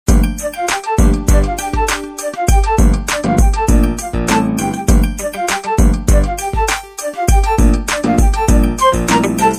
Jig; Nokia 3220

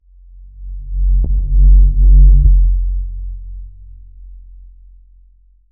Low Rumble

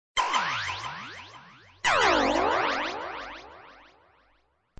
Lazer Beam

